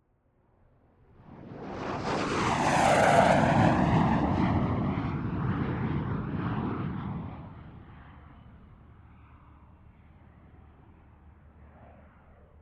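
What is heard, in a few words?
MiG-15 UTI Exterior Flyby 100m; Bert Foley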